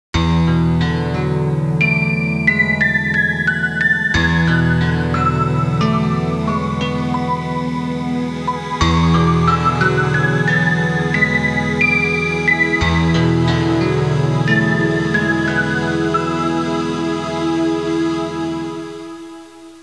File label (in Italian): musica elettronica; electronic